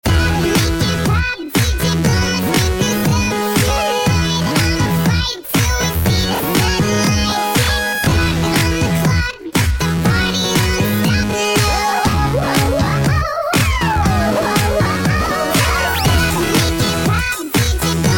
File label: oppo reno 4 pro